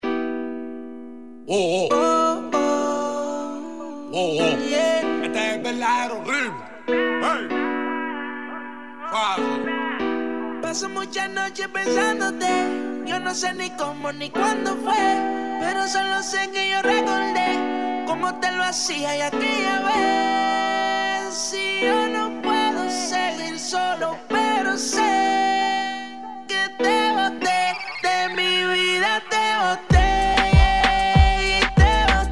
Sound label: Latin Pulse